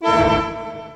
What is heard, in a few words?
guit dissonant chord; Sonic Foundry (Sony Creative Software); Ugly Remnants VOL-2